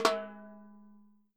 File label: Roland MV8800 percussion; MVPERCUSSION